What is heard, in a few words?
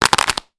Fall; blue archive